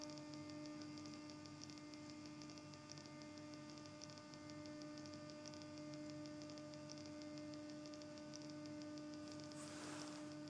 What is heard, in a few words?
static